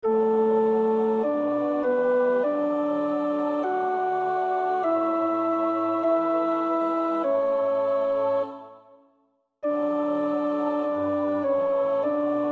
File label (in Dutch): Psalm 138 (alt); Goudimel; Oefenbestanden